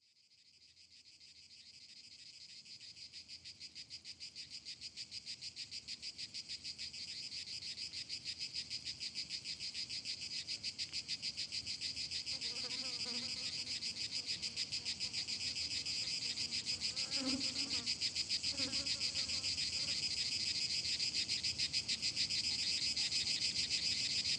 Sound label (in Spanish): GRILLOS NOISE; GRILLOS NOISE ATTAC